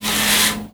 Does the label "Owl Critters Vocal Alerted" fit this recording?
No